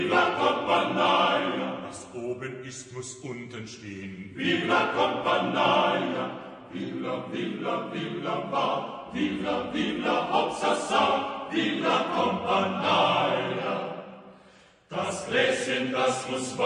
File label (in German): Chor